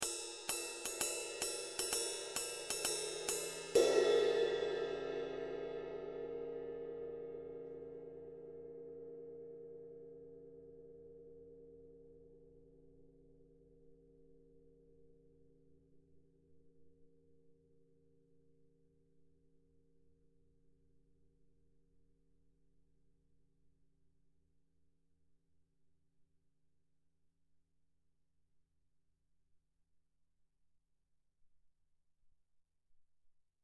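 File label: ride, ZXT 20 CTR; ZDJ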